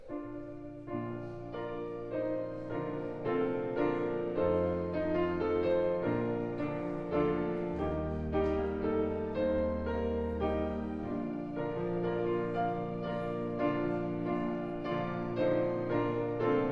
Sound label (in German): Vorspiel